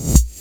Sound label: Reverse Kick, TR-606 Bent Samples; Sonic Foundry (Sony Creative Software); The Electronic Music Manuscript Collection; Battery 3 Sample Kits